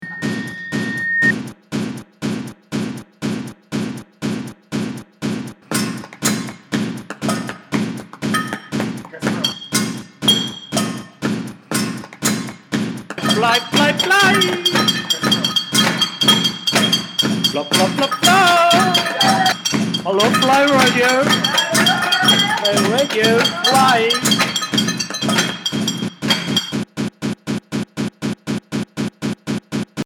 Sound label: jingle